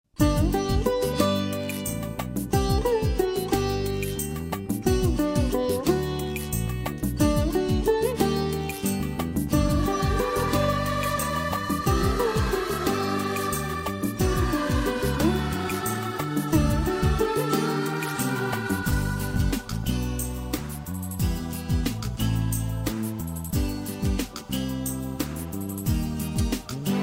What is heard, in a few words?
X'an Devotional Karaoke